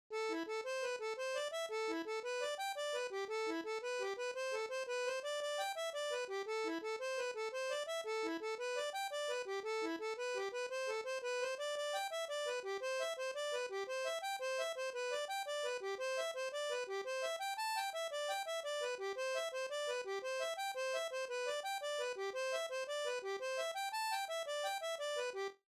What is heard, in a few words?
Goose in Cattails, slip jigs